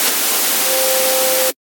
Shortwave, Effects; Instruments; Ableton; Synth Essentials; Operator

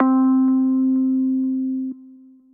little amp electric piano; Piano & Keys; Ableton; Factory Packs; Chop and Swing

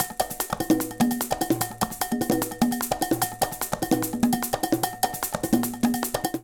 Latin Jazz 149bpm, Latin Percussion; Ableton; Factory Packs

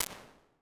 in a cylinder; Ambience and Small Rooms; Ableton; Convolution Reverb

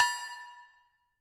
Metal Tech Bell; Percussive; Ableton; Glitch and Wash

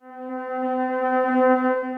Winds; Round About

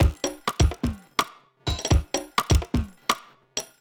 Toy Hits & Clock Groove 126 bpm; MIDI Clips; Drums; Ableton; Factory Packs; Lost and Found

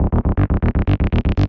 Niteret, Analog Bass; Ableton; Factory Packs; Retro Synths; Analog Bass Vol 1

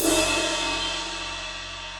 Ride Low Loud Squashed; Drum Hits; Ableton; Factory Packs; Session Drums Club; MPE Hits

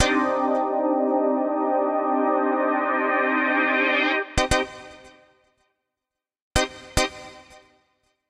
Poly Keys (Cm) 110bpm; Music Loops; Poly FabKeys; Ableton; Factory Packs; Singularities

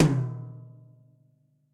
Tom Mid Direct Natural; Drum Hits; Standard Hits; Ableton; Factory Packs; Session Drums Studio

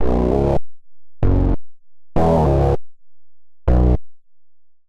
Bass Db Minor 84 bpm; MIDI Clips; Bassline; Fluff Face; Ableton; Factory Packs; Chop and Swing

